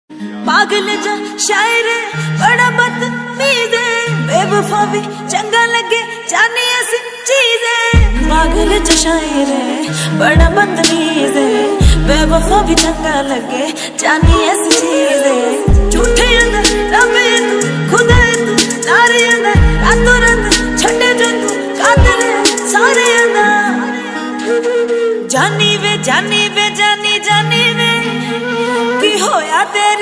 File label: punjabi ringtone